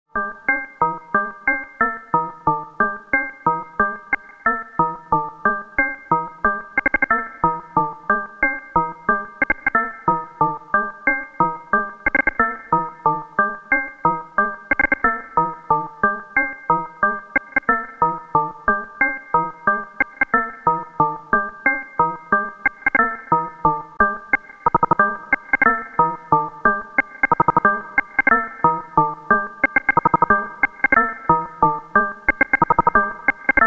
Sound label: XAOC Test Ratcheting